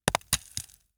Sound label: Rock Hit and Bounce Dirt